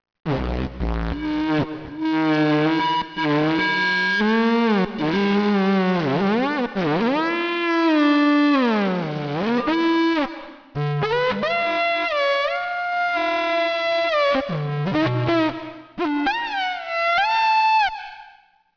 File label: Yak